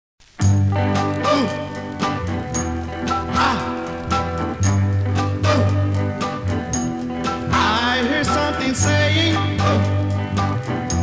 chain gang

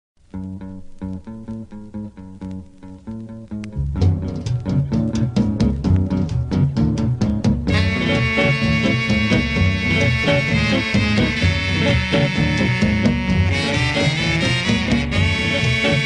Arabian Rhythm

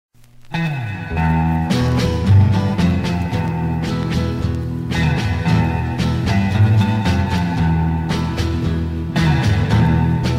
storms desert fauna inst

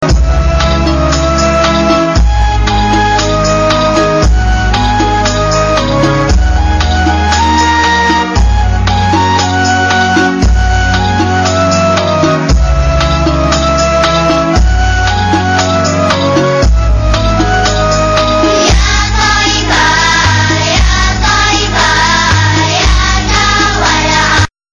hihihihihihihihihi

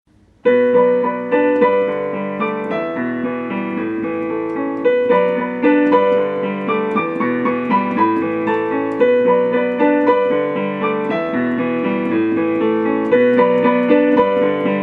piano

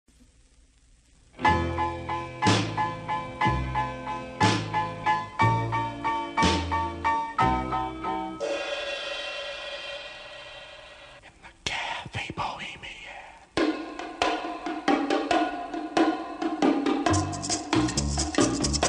cafe bohemia sax

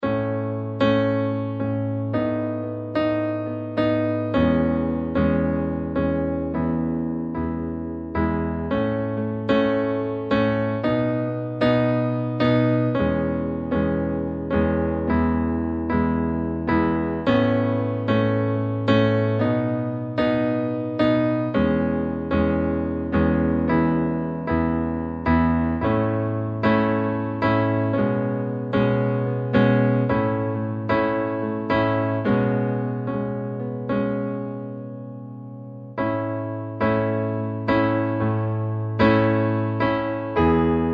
piano solo

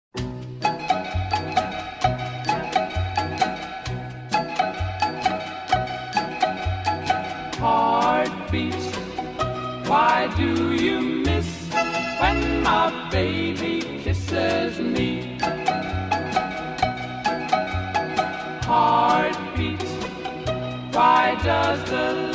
Heartbeat

